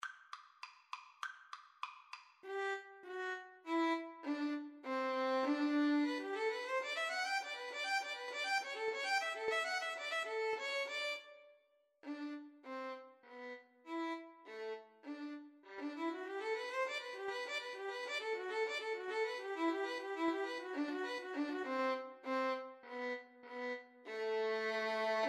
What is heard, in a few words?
Violin Flute Duet